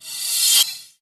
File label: Reverse Crash, Cymbals; Vengeance Samples